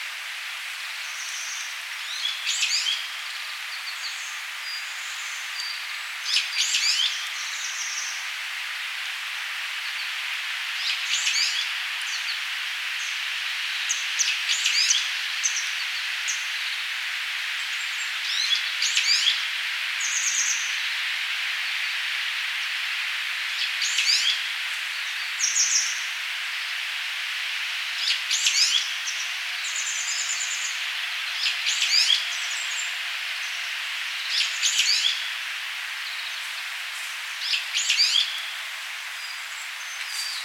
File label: Phylloscopus coronatus